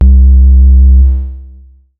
Basic Triangle Wave C2

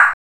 Air Drum

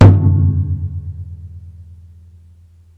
Bass Drum

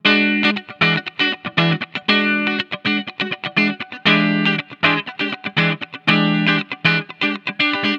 Funky Rythm

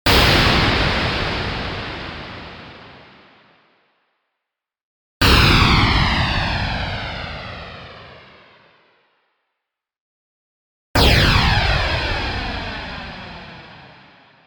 Increasingly Flangy Bangs

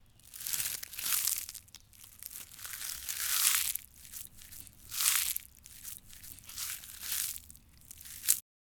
Chewing Hard Grass

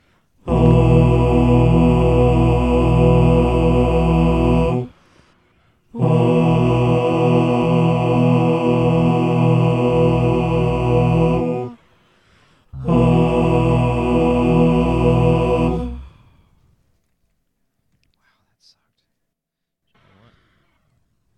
Big choir

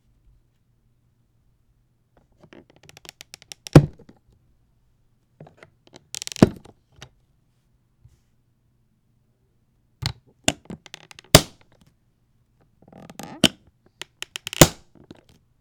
Opening And Closing Latches

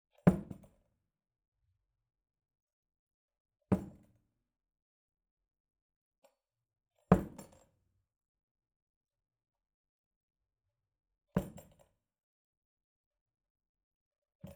Dropping Basket

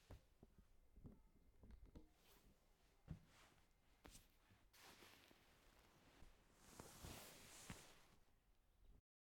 Someone Getting Into Bed

